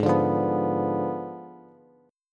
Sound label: Ab Diminished 7th Ab, Bass; Abdim7 strummed; JGuitar